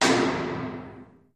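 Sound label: Impacts Metallic Echo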